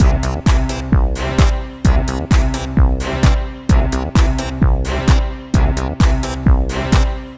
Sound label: 130 C trance kit, Drum & Perc Loops; Trance Explosion Vol1